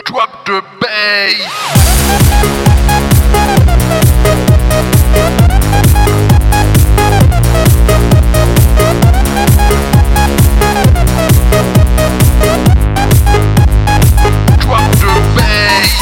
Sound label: bass